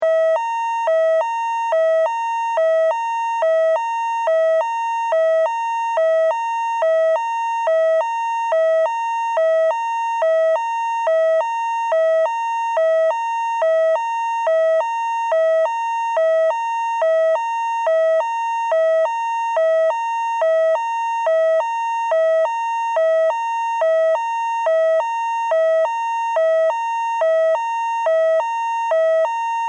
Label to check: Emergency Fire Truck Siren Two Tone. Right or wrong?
Right